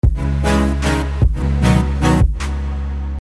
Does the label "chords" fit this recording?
Yes